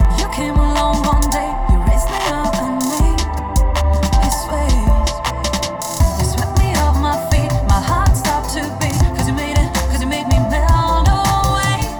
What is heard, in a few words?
Absolute 80s, free loops